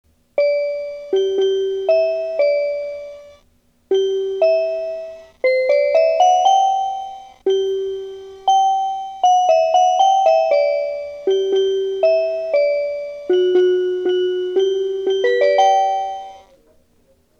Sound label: chime